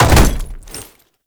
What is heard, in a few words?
Land Heavy Glass, movement; Warframe; Uriel